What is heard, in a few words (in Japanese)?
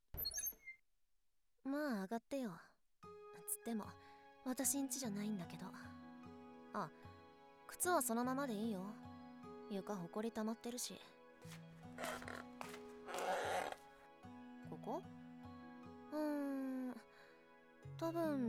廃屋でキスと腋嗅ぎ 効果音BGM入り